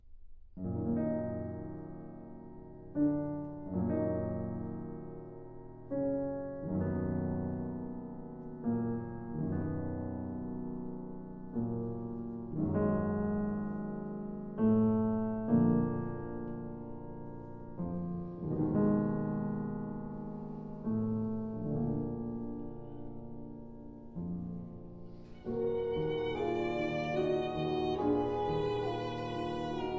Trio for Piano, Violin and Horn in E flat major, Adagio Mesto; Brahms Trio & Quintet